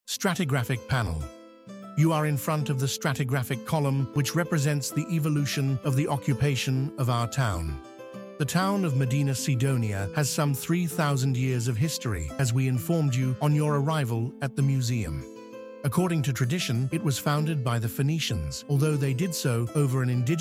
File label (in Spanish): música; archaeological museum audio guide